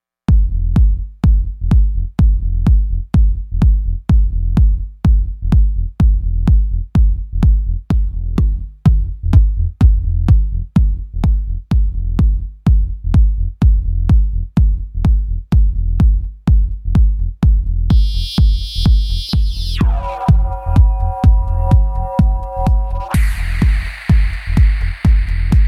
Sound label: electro house mix